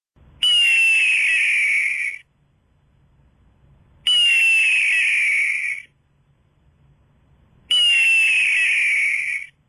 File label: low volume hawk